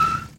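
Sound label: Break Squeal short, Monster Trucks; Nickelodeon - Destruction Truck Derby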